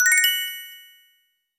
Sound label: result star get; HeavenStudioPlus; rankings